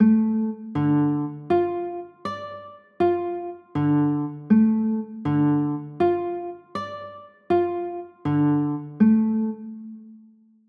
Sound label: d m, chords